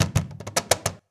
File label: CAJ FILL, cajon fill; Univers Sons - Raricussions